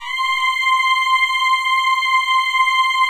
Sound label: PAD; Best Service; XX Large; Pads